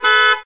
Auto Horn Foreign; Automobiles

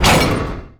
Metal Grate Stop; Doors

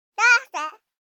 BABIES TALK Girl 12 months Dada; Baby Boom

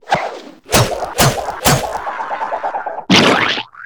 Cartoon Teddy Bear Fan; SFX Kit; Foley - Misc

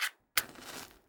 strike a match1; NS Studios - ultra sound collection

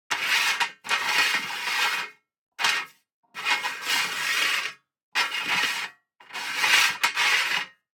DICE on Metal, Slide, Standard, 2 Two Dice; Samples; ArticulatedSounds; DICE Sfx Party (Extended)

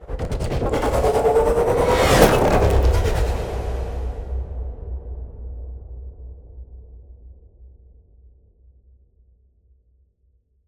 Ice Spell Whoosh, Cold Whooshes; Arctic Stutter; Arctic Aura

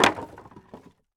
IMPACT Wood Plank On Wood Pile; mono; IMPACTS; Universal Sound FX

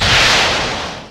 Rocket Launcher Shot; SFX Kit; Weapons

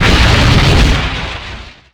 Explo Large Ice; Destruction

